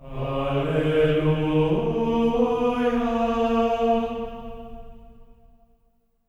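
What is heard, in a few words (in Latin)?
alleluia D#4; Spectrasonics; Symphony of Voices; GR ALLELU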